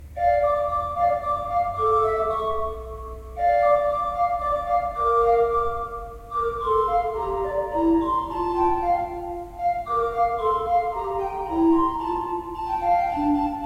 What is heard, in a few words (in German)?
Stahlhuth, gebrauchtorgel